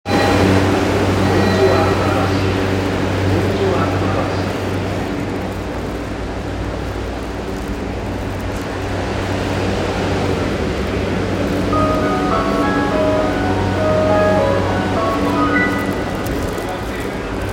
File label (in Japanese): 浅草線本所吾妻橋東, train sounds; 都営; 都営浅草線本所吾妻橋東